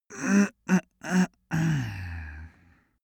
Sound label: cartoon male harrumph slow